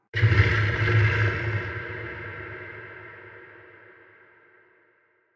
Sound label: shark; Barotraumatic; Paralyticshark